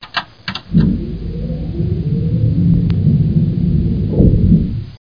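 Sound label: gear down; flight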